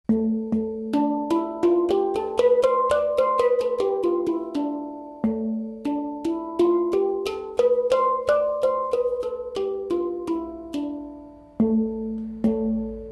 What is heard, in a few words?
Hang drum Dorian